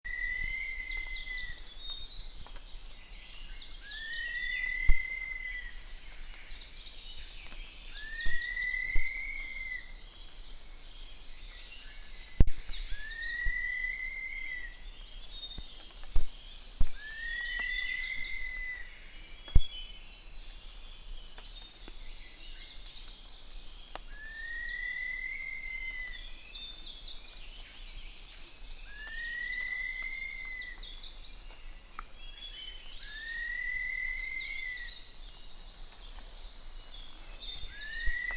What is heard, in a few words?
scaly kingfisher